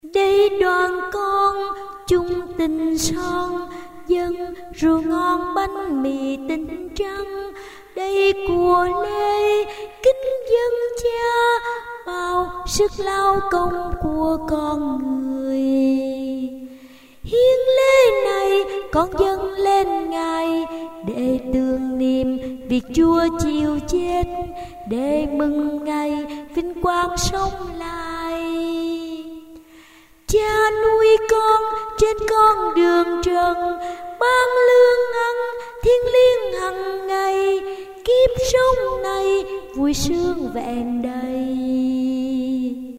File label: sop alto